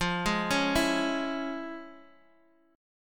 F Minor Major 7th Sharp 5th, F, Guitar, DADGAD; x,x,3,1,4,2

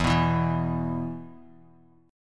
E 5th, Bass Standard; strummed; JGuitar

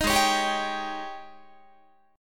G sharp Major 7th Suspended 4th, Guitar; G#M7sus4 Chord

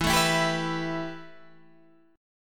A 7th Suspended 2nd, E

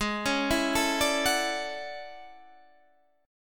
A Major 13th, guitar

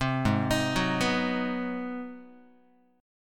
G sharp 7th Sharp 9th, Guitar; G#7#9; arpeggiated; 3,4,6,4,3,x; JGuitar

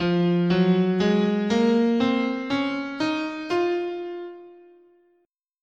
F Phrygian, scale

